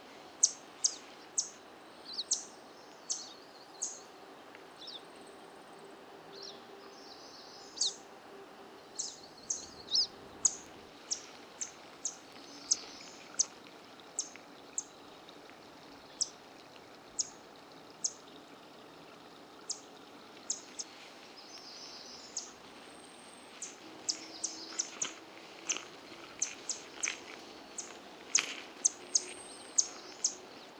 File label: Blackpoll Warbler Setophaga striata; banded birds; Mount Mansfield